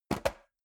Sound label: metal box small close; soundpool